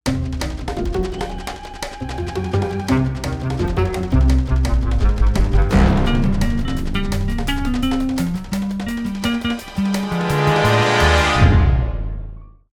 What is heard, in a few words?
Hoarder Trap Fail; Disney Infinity